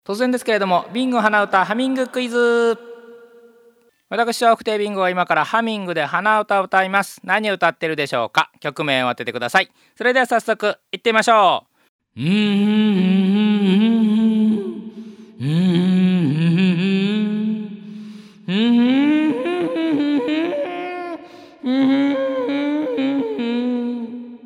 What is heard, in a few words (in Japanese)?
hanauta